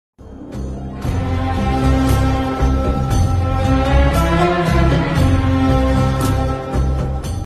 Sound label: Egyptian music; موسیقی مصری بی کلام